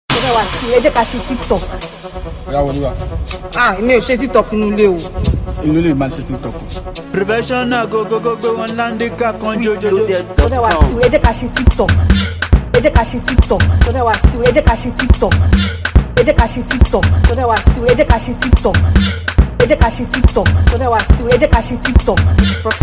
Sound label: professional beat; TikTok